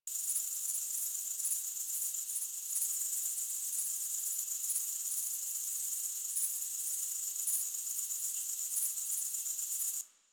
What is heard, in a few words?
Night Insects High Loop F; Ambience; Props; Loops; Warframe; Eidolon Plains